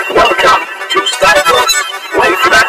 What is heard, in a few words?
vocoder